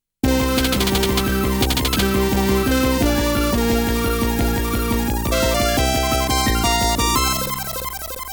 WIN OUT!

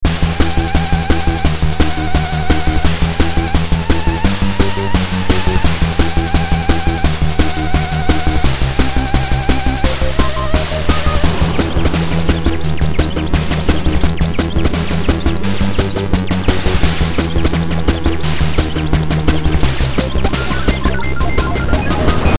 song

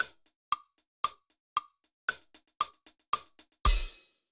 Metronome

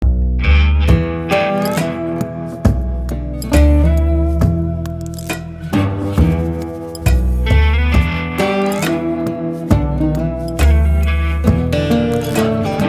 Market; Mass Effect Andromeda; Kadara

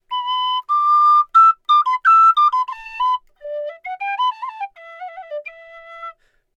Flute Player Melody; gamerip; The Witcher 3 Wild Hunt

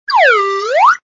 Banana Peel; Go Diego Go Rainforest Adventure

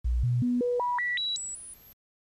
Sound burst Test; Hydlide Renewal

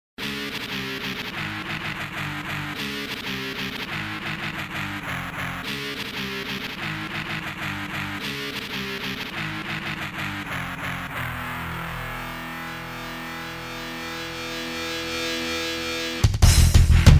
samba; battle circuit; Capcom Lovers Arcade Collection Volume 2

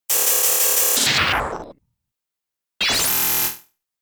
Charge Beam

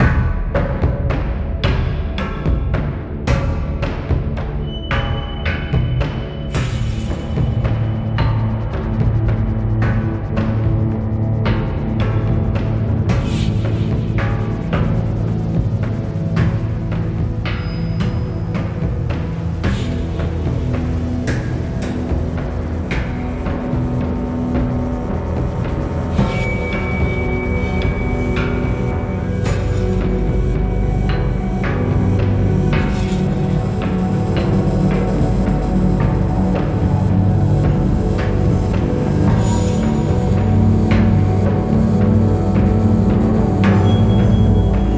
loop Demons

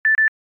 Beep Beep

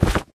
Shield Sleepy Collect; doodle jump 2; android

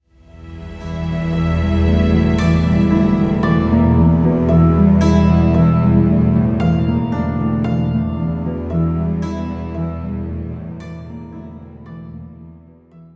Stinger; Bridge SokChoke; Dishonored

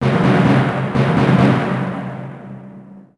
Sting Combat Combat Start

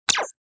Button Back

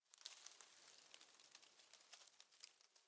Hay Movement; The Town

